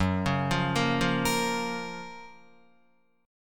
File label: Gb Major 7th Gb down Guitar; GbM7 arpeggiated; JGuitar